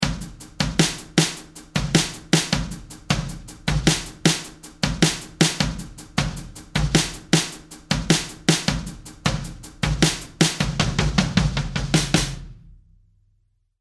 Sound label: 4 bar drum loop 78 bpm; sound bites resource kit